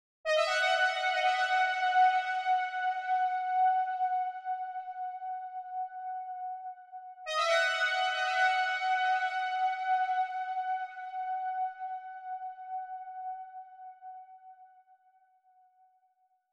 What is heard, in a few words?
137 BPM G# Min Brass High; Cymatics; Black Benz; 2022 Melody Collection Platinum Expansion